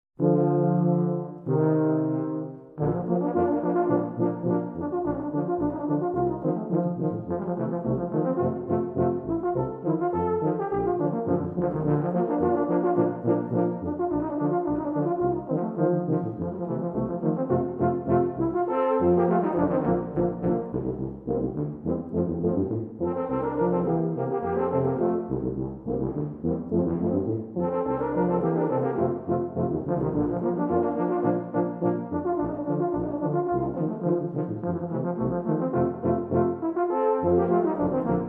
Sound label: tuba & tuba; Contra Band